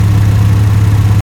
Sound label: Clio 2020 Idle; Car Simulator 2